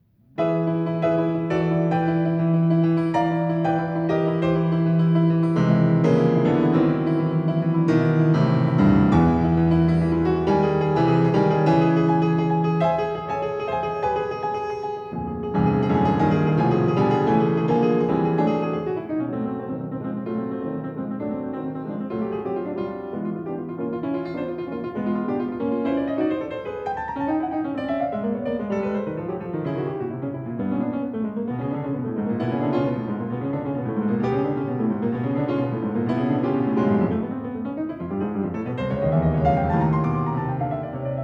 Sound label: 6 Dances in Bulgarian Rhythm; Mikrokosmos, Sz. 107 Vol. 6 6 Dances in Bulgarian Rhythm I, No. 153